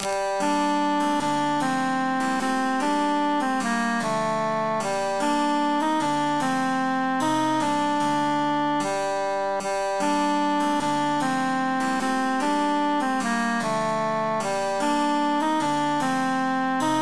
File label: three country dances altus emphasized